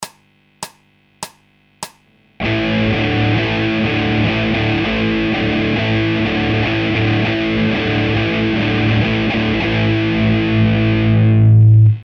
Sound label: Hard Guitar Loops